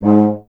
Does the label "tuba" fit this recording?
Yes